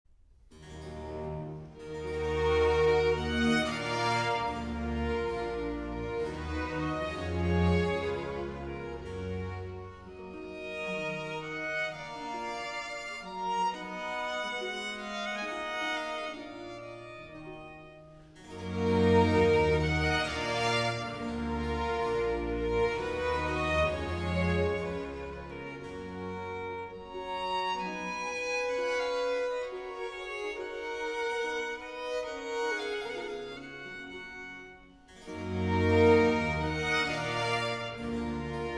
Concerto grosso in E Minor Larghetto; Op. 6 No. 3, HWV 321; Handel; Concerti grossi, Op. 6

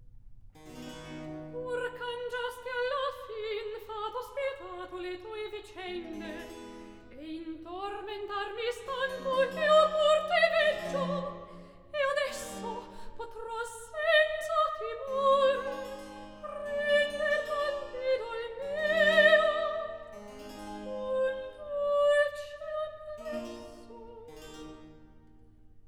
Recit; Act III; Pur cangiasti alla fin; Handel; Ottone, re di Germania; Ottone, HWV 15

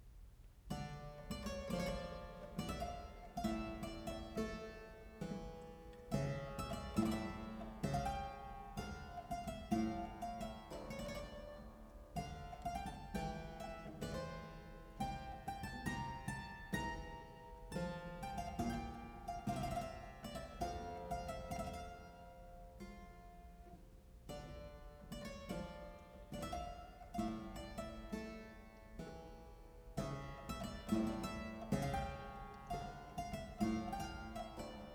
Polonaise in D Minor; Bach; Anna Magdalena Bach Notebook (highlights); 尼古拉斯 · 麦克吉根

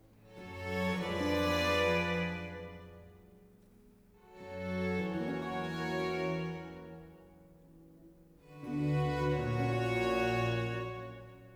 Concerto grosso Adagio Andante Largo; No. 11 in B-Flat Major; Corelli; Concerti grossi, Op. 6

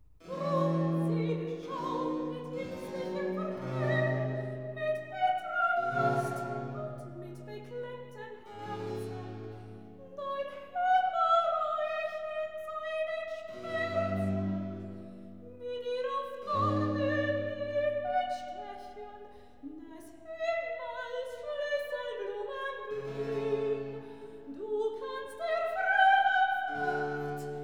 Recitativo Drum, Seele, schau; Brockes Passion

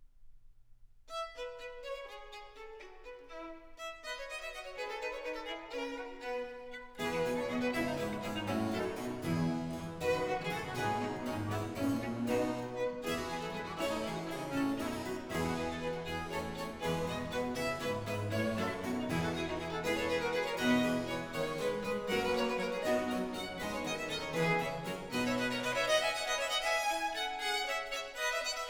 Sonata in F Major, Allegro; Purcell & Blow

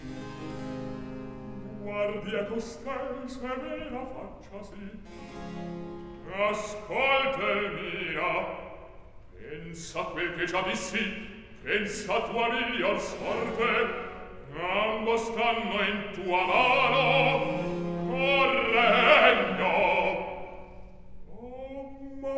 Recitativo; Act Two, Scene 9; Guardia a costei; Floridante

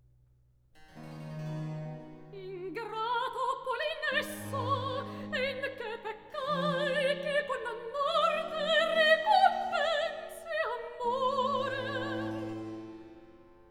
Recit.; Act 3; Ingrato Polinesso!; Handel; Ariodante, HWV 33

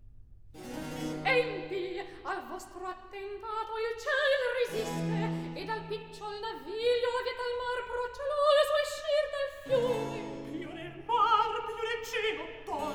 Recit; Act 3; Empi, al vostro attentato; Handel; Ottone, re di Germania; Ottone, HWV 15

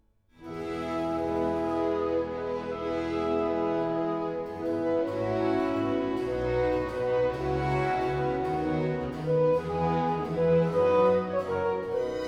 Sinfonia. (Larghetto); Act 1; Handel; Ariodante, HWV 33

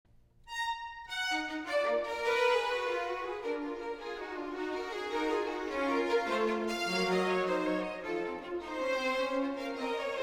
Concerto grosso in E Minor Andante - Adagio; Op. 6 No. 3, HWV 321; Handel; Concerti grossi, Op. 6

